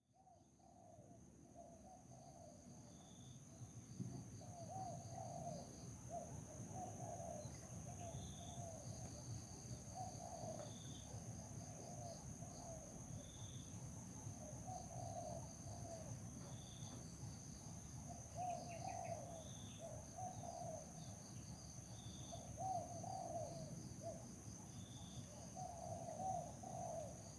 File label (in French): Oiseaux tropicaux au bord des rizieres